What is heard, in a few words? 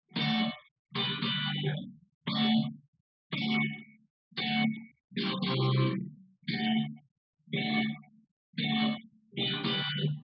wdrums; SiSEC10; underdetermined